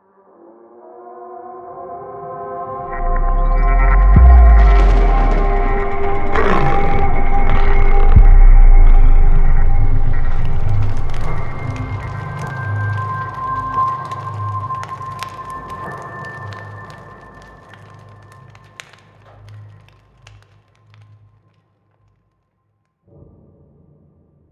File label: Roar